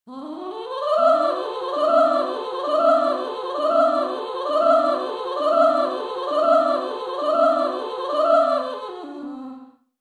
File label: C dom Arp